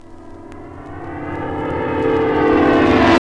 REV CRACKL, sweeps; AMG; Now CD-ROM (Roland); NOW! FX

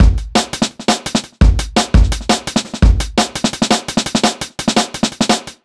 E Beat, Electronic Beats

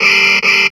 GTR, Hip Hop R n B; USB Soundscan vol.19; AKAI

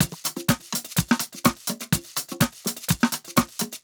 pushed thin, 125 Beats; PowerFX

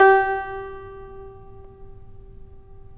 tar; Roland - LA Composer vol. 3

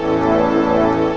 ORCH, STRINGS; STRINGS3

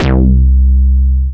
BAS, Eurotech; Sounds Good

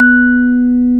B3, Vintage Timetraveler; Sonic Reality; HAMDIRECT

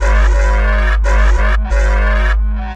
Bass loops; neuro; Optiv & BTK

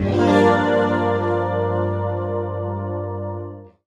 guit strum (D); Sonic Foundry (Sony Creative Software); Ugly Remnants VOL-2

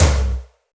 Rock Tom, Roland BK-5

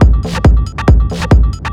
drum 138, drum-loops, loop-grinder, Techno; ProSamples vol.45 - Techno ID; Best Service

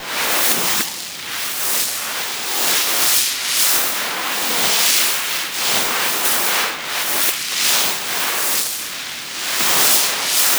RAPIDS; Spectrasonic Distorted Reality 1; MENTALRAPIDS

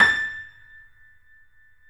A, 3-D Audio Collection, 3D Pianos, Yamaha C7

